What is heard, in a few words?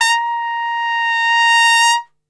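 A#2 trumpet; Best Service; Brass Super Section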